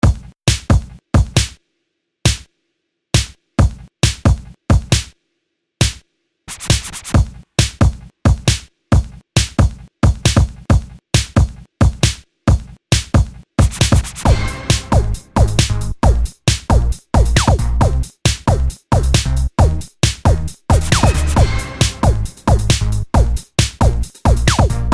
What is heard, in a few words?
I Like Beats